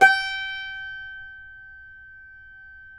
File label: CUMBUS G5 C, guitars; Spectrasonics; Hans Zimmer Guitars VOL-2